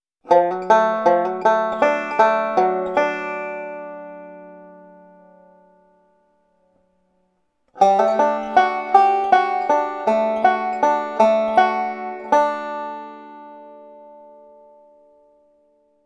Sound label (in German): fill in licks auf d